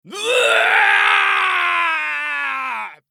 死亡; グルトルデ

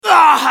ダウン; ソホ

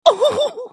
ダウン; ジャック

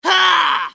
攻撃短; コインブラトゥルーパー

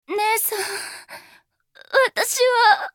死亡; ナタリー

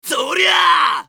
攻撃長; コインブラトゥルーパー

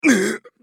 被ダメージ; ホセ

